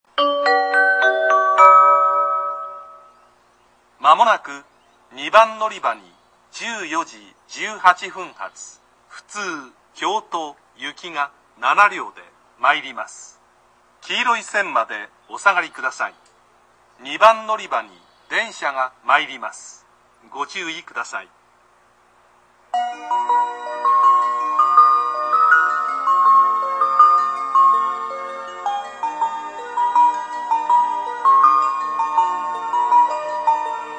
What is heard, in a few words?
sakurasyukugawa sekkin; kobe kyoto biwako-line